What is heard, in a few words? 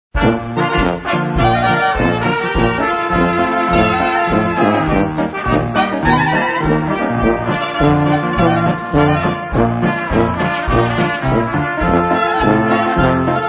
GREEN GARDEN POLKA